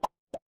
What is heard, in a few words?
cartoon pop